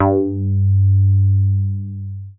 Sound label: G2, BASS; Q Up Arts; Dream Experience